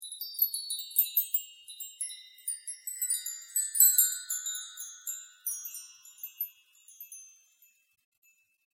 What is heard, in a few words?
chimes slow